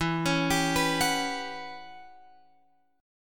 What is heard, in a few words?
E Minor 6th Add 9th guitar chord, Open F; Em6add9 arpeggiated; JGuitar